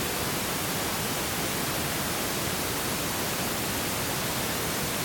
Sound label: Pink Noise Loop